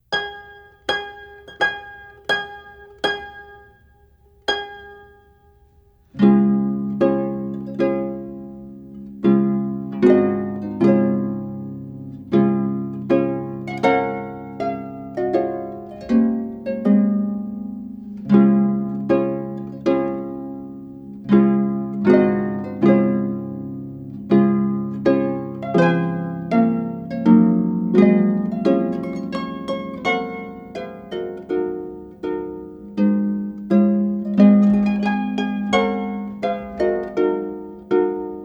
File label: Solo Harp